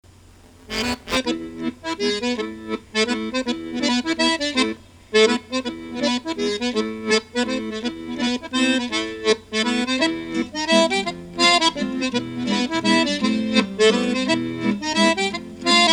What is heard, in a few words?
Mazurka